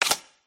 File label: card slap